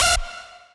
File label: lead